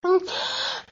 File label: Breath; Lewd SFX